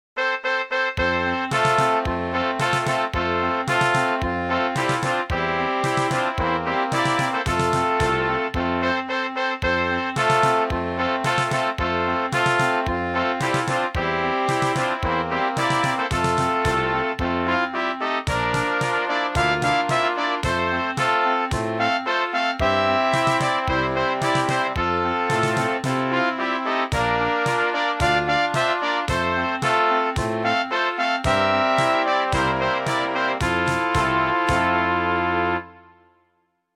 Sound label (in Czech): Fanfary